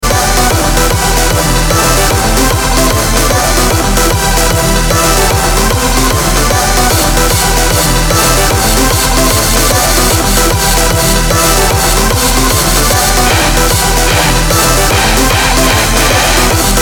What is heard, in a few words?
Disco 90